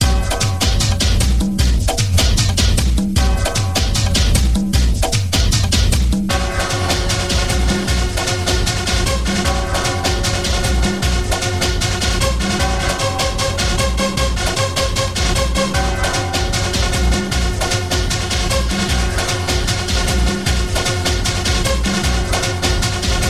medley 150 bpm

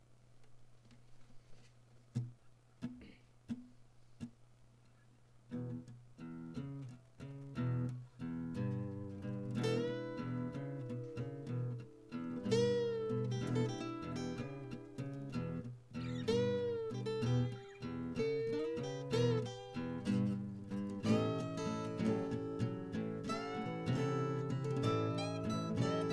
night blues

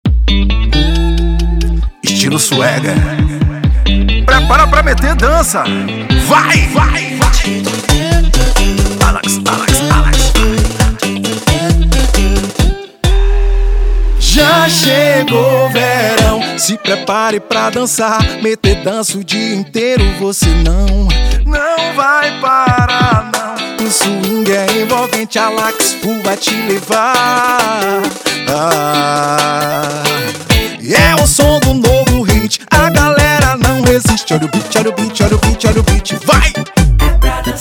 beat dance samba

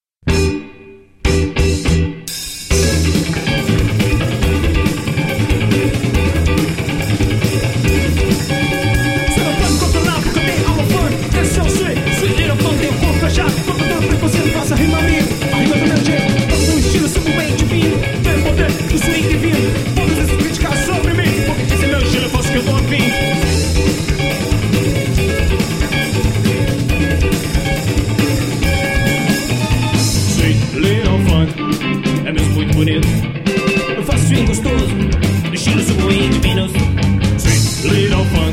funk

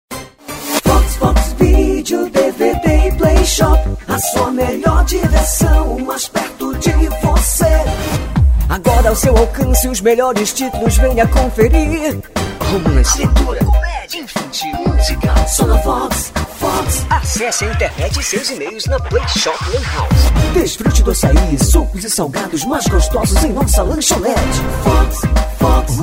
jingle; fox locadora

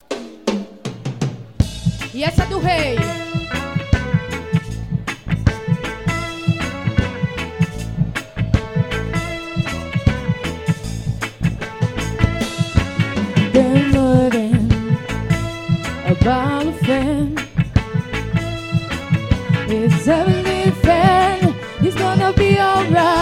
reggae

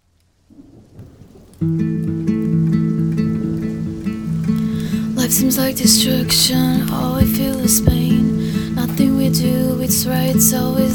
spooky scary; planet earth

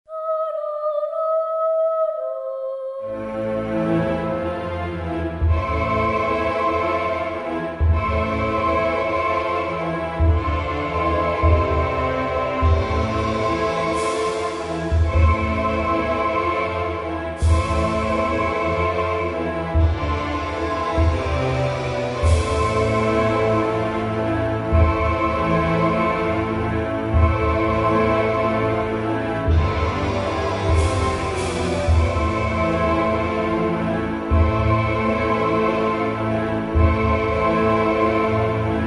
dark forest

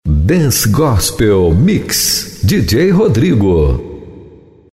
dance gospel mix

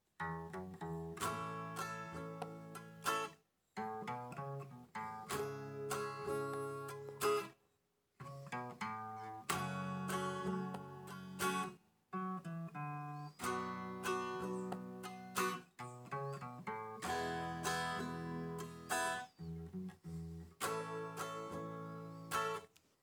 surfing bass

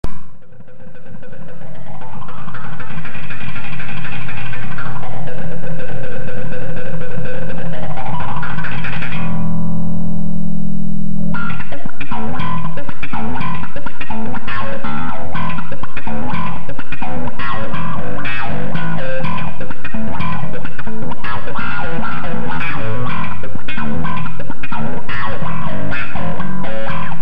slap dist wah bass in the bathroom

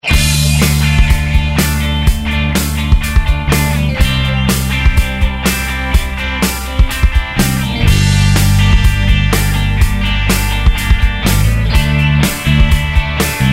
short waves

